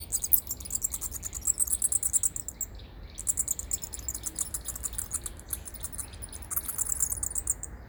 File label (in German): Abendsegler, fledermaeuse; Abendsegler kurz; wilma wusel entdeckt fledermaeuse